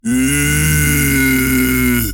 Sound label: Screams & Shouts Zombie; SoundBits